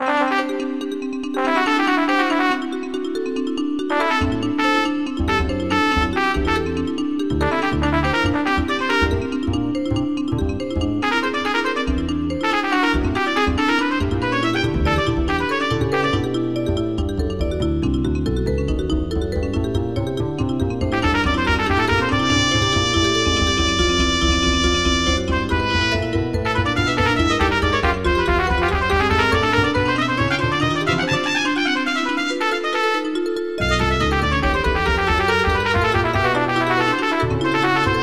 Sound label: three, synthojazz, for trumpet, bass and vibes; impro